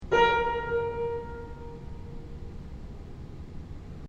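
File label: Piano Bb6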